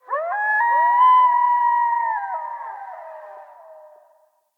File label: Wolf Cub Trapped Call Distant; Vocals; Animals